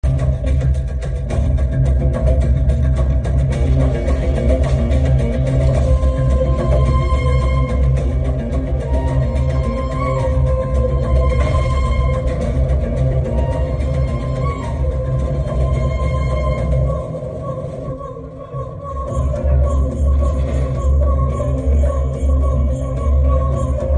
Villain Group Dance Ringtone; Jailer

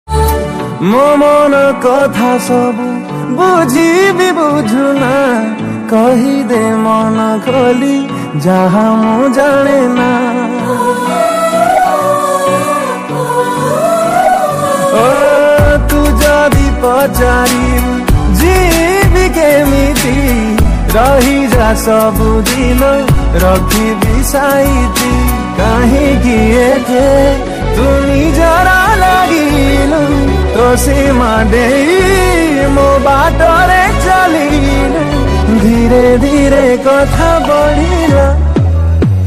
Odia Ringtone

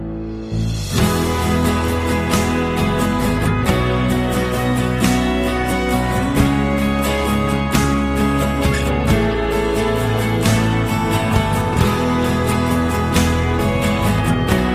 instrumental Ringtone

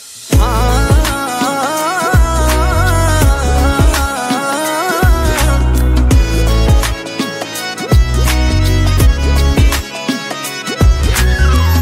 Song Bgm

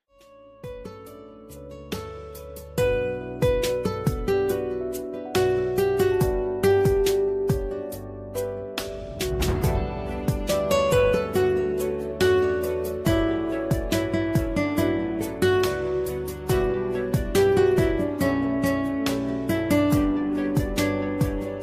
Bangla Ringtones

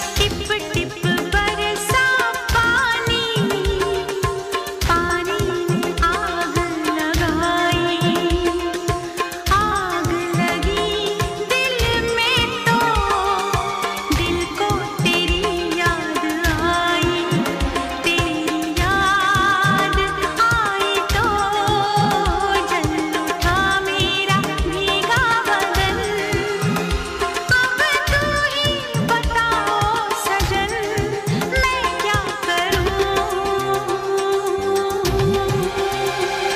Old Hindi Song Ringtone

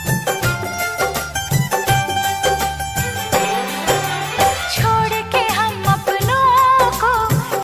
Top Old Ringtone

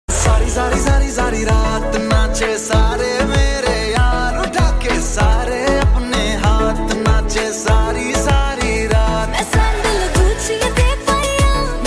Punjabi Song Ringtone

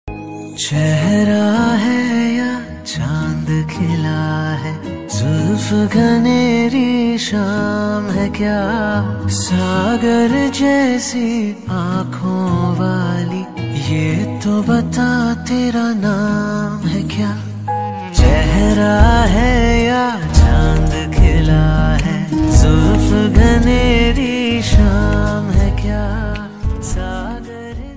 Love Song Ringtone

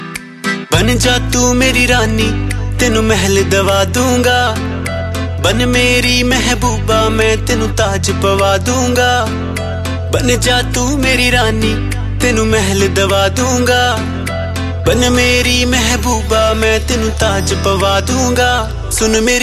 New Punjabi Ringtone

